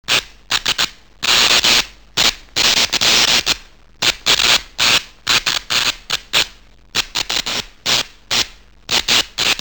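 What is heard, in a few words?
zvuki toka, electric current sounds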